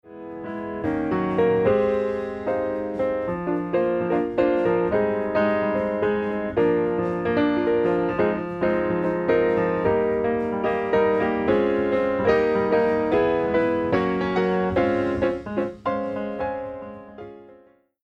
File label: Mix Piano Limit On